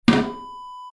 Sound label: toilet seat cover down